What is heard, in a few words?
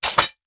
Sword Skin Cut And Stab